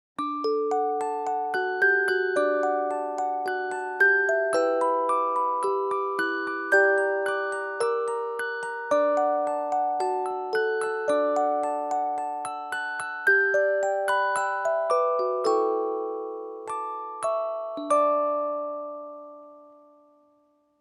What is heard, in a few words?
Music box Impro